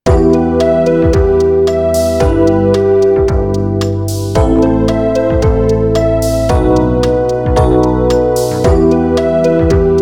Rock Ballad Classic Theme Synth Band in Em 112 bpm